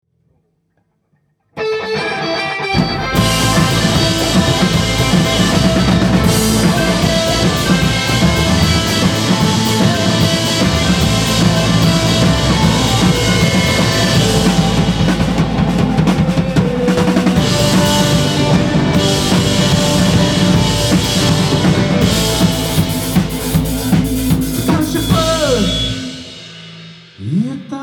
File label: trumbreak; Mälar